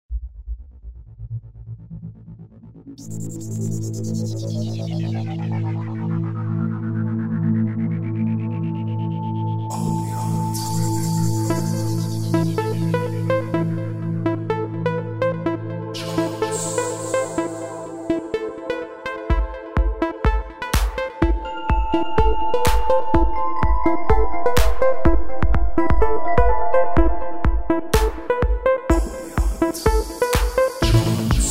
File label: AMBIANCE; DannPowers